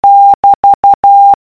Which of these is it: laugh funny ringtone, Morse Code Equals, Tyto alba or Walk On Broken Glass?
Morse Code Equals